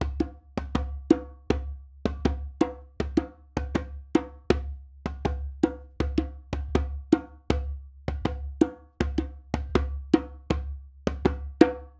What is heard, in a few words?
djembe loop 80